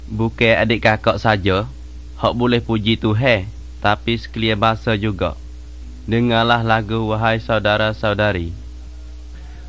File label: songs malay pattani